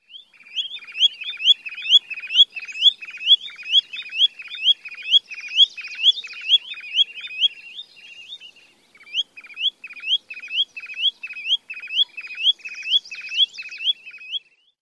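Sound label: SPOSAN flight song, spotted sandpiper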